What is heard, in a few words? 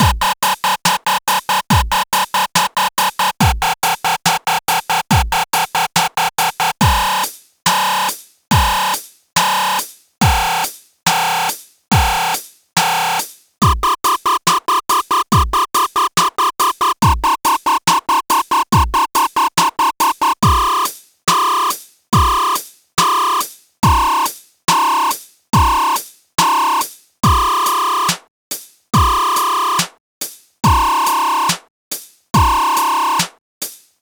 Basic Dub